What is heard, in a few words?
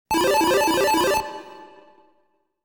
TRAP Teleporter; suite wizardry ii; legacy of llylgamyn